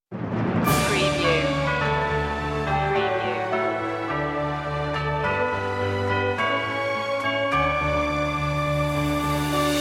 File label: orchestral loop intro; joy to the world; media music now